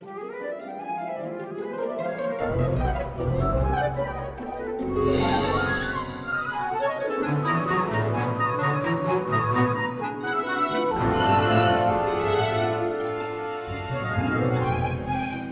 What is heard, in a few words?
Orchestra; orchestral reverb